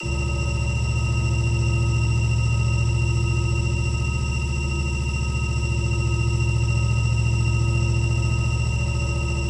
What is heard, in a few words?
electric small, engines; acf engines